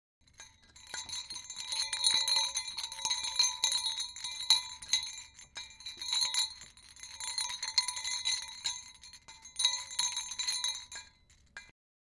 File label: Jingle Bells